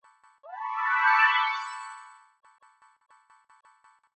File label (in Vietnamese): Âm thanh biến hình